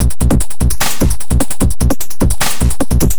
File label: Beat, Industrial